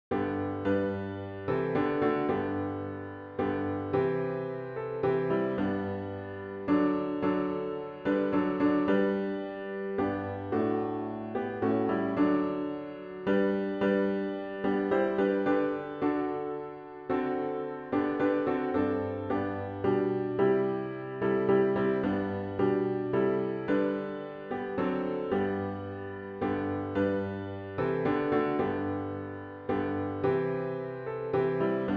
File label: piano only; I Need Thee Every Hour